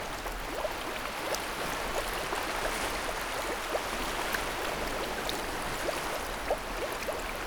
tide ctr loop shallow, ambience

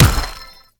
mag wheel

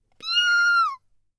classic biu

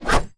hidden weapon fast

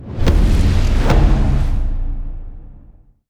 splash ink

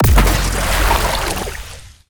sea sword

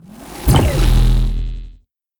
skill shield fresh

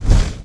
p atk firemag

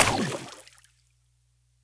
water cannon token

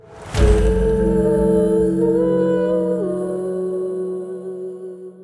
skill siren sing

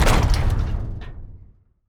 door close

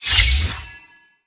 atk electric stick

